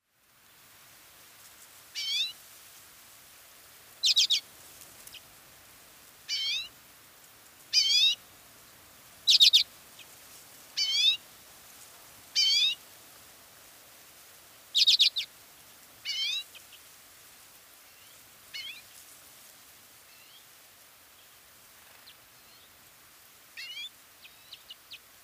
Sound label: Common Redpoll Acanthis flammea